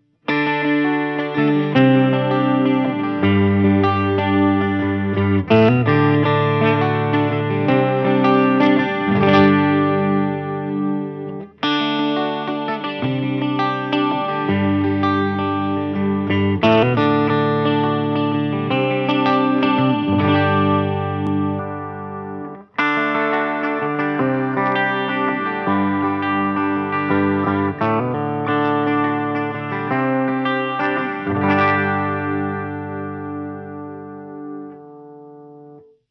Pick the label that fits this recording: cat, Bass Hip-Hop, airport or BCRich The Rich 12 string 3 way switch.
BCRich The Rich 12 string 3 way switch